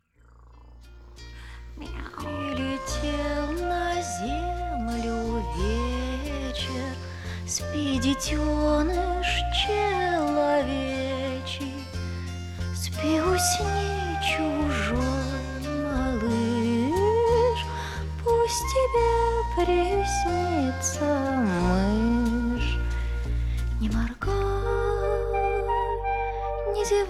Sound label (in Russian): Колыбельная кошки